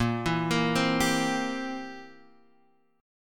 C 7th Bb

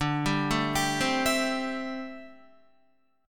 D 9th Suspended 4th D down Guitar; D9sus4 arpeggiated

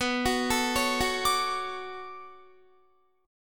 B-Minor 9th B down Guitar; arpeggiated; 4F,4A,5C,5G,5C,6E

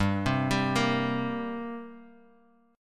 G Minor Double Flat 5th, Guitar, Half Step Down

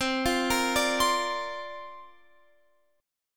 C 9th Suspended 4th C down Guitar Open F; C9sus4 arpeggiated

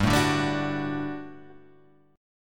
G Major 7th Suspended 2nd G down

